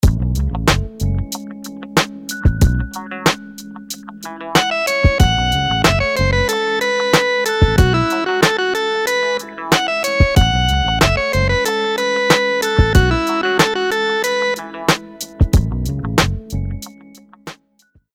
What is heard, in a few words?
rhythms dotted 8s